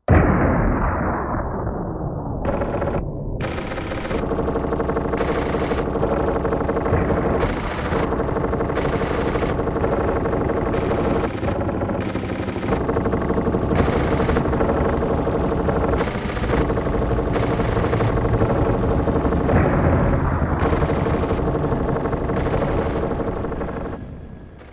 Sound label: War Scene, Sound design of a battle with explosions, machine guns from multiple positions; and war helicopters; Bombs